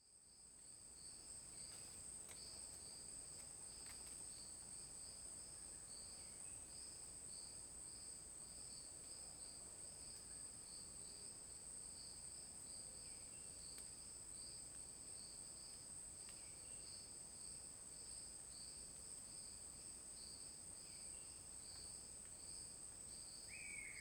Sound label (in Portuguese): Ambiente na floresta com grilos e canto de passaro isolado; insetos